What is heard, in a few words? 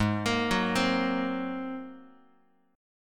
Ab 9th Ab down Guitar; Ab9 arpeggiated; JGuitar